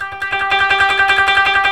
KOTO TREM

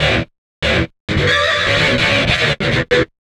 GTR, Chemical Beats

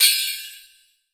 chechen open, cymbals gongs

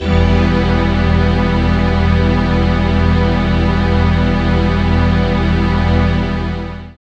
SYN DANCE; Sound & Vision - Gigapack II

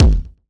Kick, Yamaha PSR E305

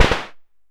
Perc Hit, Noise

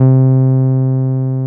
TB 303

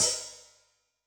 HiHat, Yamaha DTXpress-II

